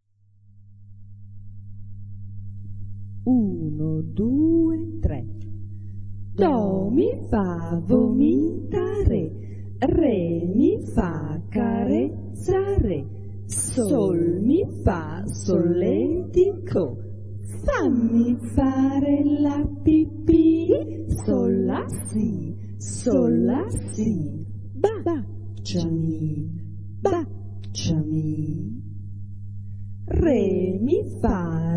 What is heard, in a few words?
doremi; sax